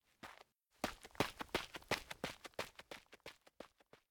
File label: Run; LOOPERS